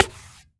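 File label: high bongo; AKAI S-Series CD-ROM Sound Library VOL-5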